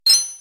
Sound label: Mining Iron; infinitefusion